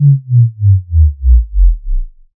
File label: Sub Drop Basic - Gate